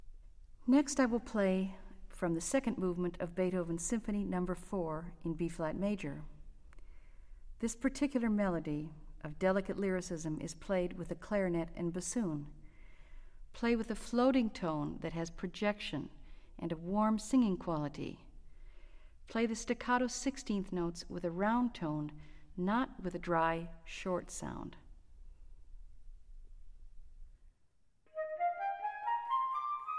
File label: Orchestral Excerpts For Flute